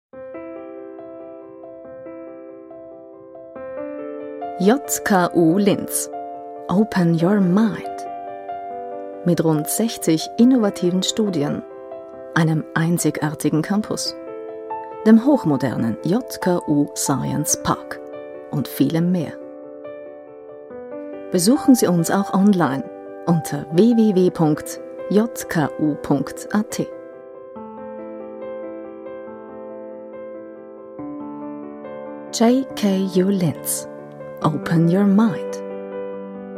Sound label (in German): Warteschleife